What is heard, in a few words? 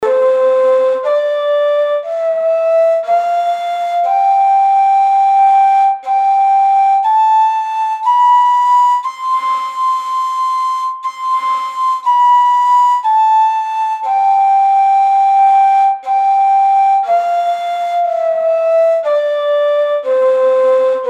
ney çargah